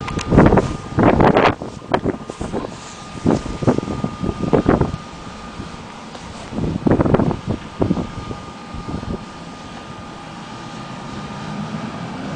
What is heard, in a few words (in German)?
ICE Ingolstadt Hbf